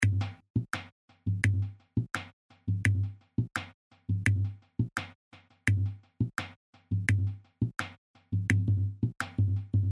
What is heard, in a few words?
LOOP